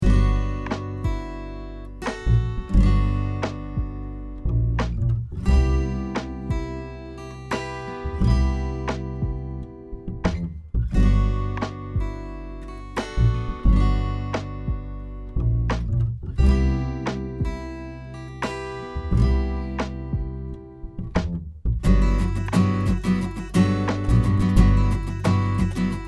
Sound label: CHANDLER Limited TG 2 500